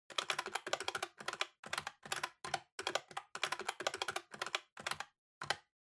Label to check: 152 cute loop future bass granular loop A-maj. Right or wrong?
Wrong